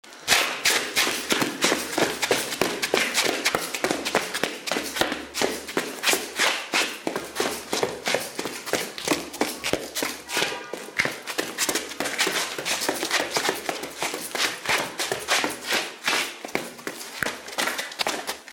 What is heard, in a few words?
two running on stone